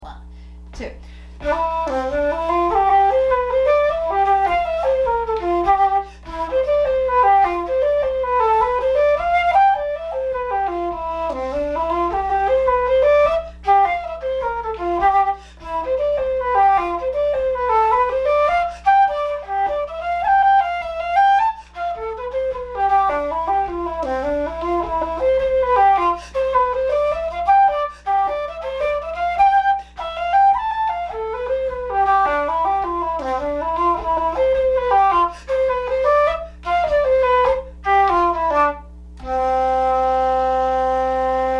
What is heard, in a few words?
SOLO flute